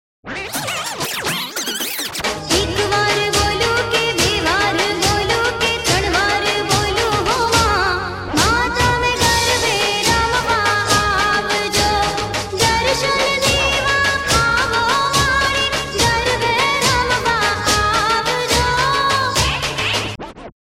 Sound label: heart beat navratri